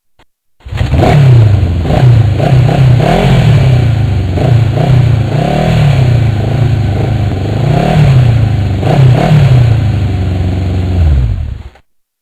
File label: Apache Engine Revv